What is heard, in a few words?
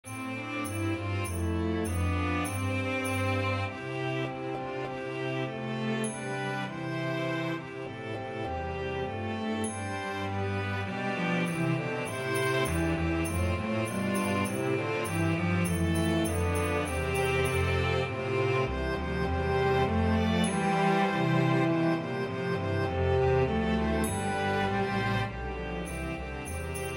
Cello Track with Melody; The Holly and the Ivy